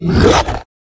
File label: troll wound